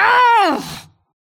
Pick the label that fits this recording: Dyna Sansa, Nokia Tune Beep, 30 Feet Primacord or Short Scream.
Short Scream